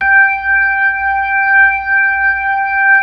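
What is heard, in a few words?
B-3 Club crossfade, Keys of the 60s and 70s; Roland LCDP10 Keys of the 60s and 70s 2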